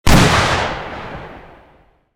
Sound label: velopal shoot, weapon; metrocraft